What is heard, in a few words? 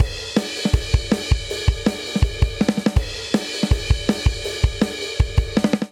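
Punk Rock Outro 162 bpm, drums; 4 4; MIDI Clips; Ableton; Factory Packs; Drum Booth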